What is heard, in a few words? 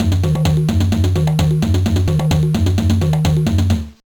Jingle; kurohige no kurutto jintori 2002 gba